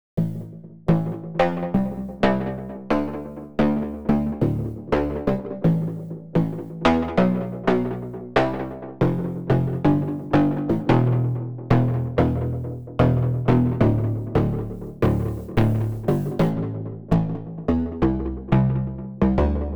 acoustic faint signal; dimensions

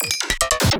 Drum Fill 150; spectrum; Caterwaul

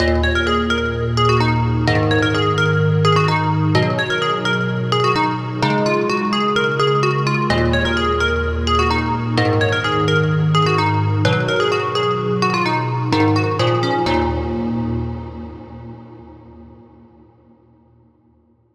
bell clockwork prophet; cosmic

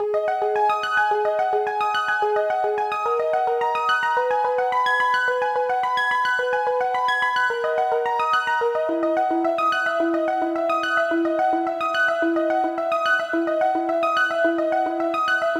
Keys

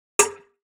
perc witches cowbell; breakout

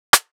classic rim; breakout

